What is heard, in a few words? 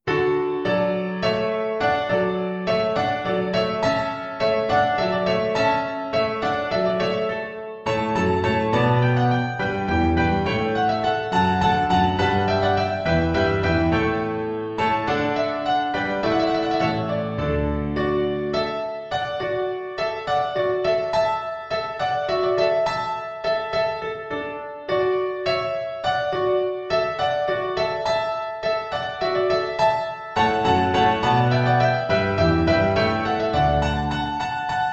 Alla Hornpipe